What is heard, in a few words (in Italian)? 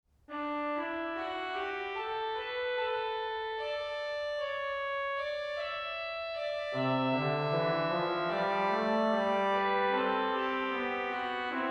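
canoni, organist; 4) Canone quarto RPS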